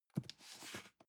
cardboard box medium grab slide soft; objekte

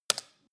keyboard hits hard, computer; kommunikation

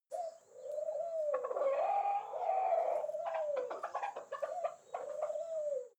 hens and pigeon; natur-tiere; huehner

